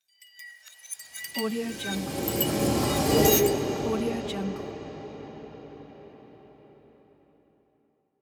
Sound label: time warp reverse